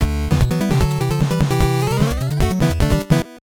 Stage Clear; mad city the adventures of bayou billy; konami beat em ups; famicom; Backwater Courage

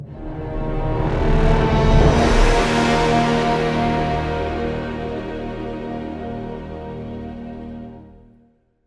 Storm Approaching Arrived End; StormsMonsters

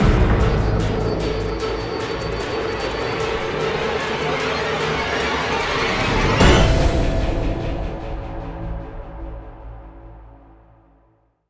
Suspense Intro; Prototype

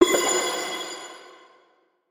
Coin Hit; we are the dwarves gamerip 2016

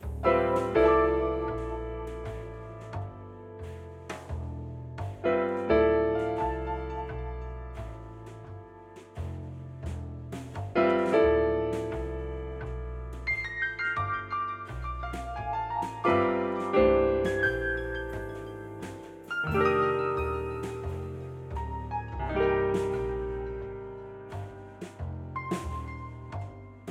Gameover Jazz

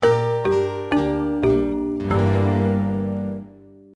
Jingle Toobad; Petz Rescue Wildlife Vet; NDS

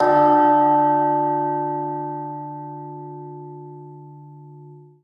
BELL

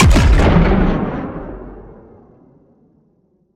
Sciweap Shot Large Gun Cannon Blast Parry

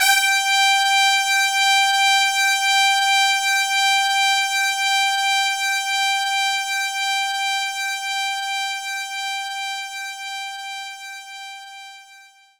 Brass Section G5; knights try

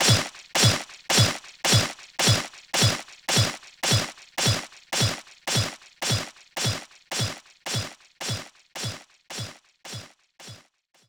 swords

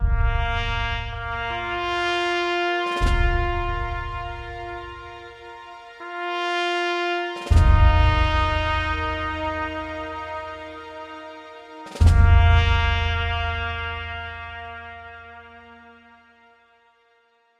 Commonwealth Defeat (alternate)

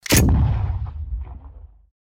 blunderbuss shot with barrel

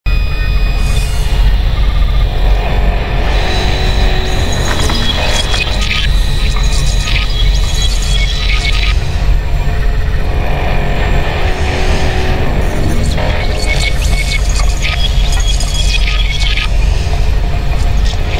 Hack Noise

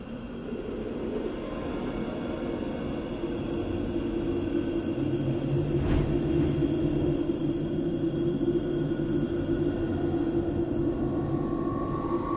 Ambient Air Currents; rayman 2

